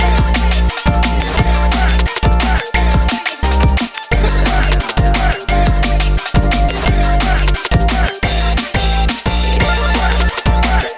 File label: Intro Song